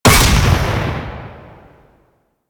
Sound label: Shot; Weapons; Cannon; Kuva Zarr; Grineer